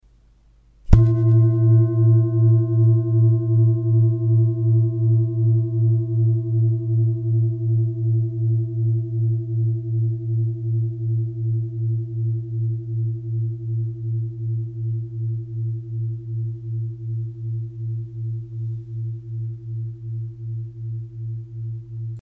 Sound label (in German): Klangschale